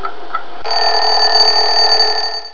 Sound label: alarm; An Accidental Expert's, How To Leave Your Body Handbook